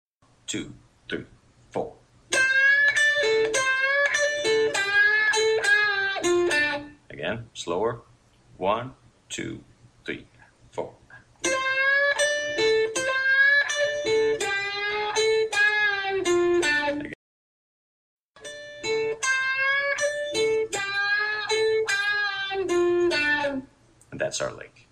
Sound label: lead with the wah